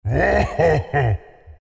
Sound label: laugh, zh; Chaknight; Dota 2